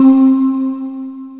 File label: MD CHOIR